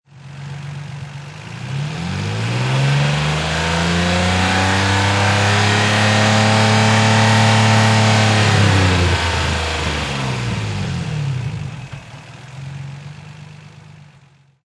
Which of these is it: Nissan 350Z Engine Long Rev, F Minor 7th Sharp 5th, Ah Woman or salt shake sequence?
Nissan 350Z Engine Long Rev